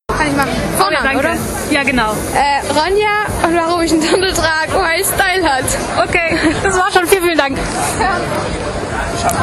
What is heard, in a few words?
hat Style